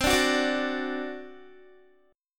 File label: C Suspended 2nd Flat 5th C down Guitar; Csus2b5; strummed